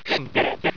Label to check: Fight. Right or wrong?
Right